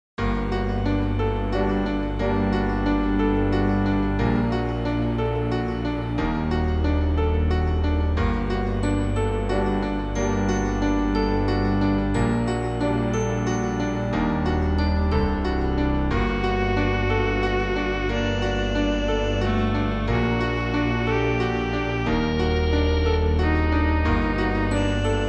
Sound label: bgm